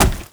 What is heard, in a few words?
land wood; gamerip